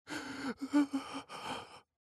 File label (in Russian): ispugannoe dihanie